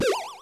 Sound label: MOVE; C64 Dreams; Multi-Sound Default